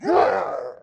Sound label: injured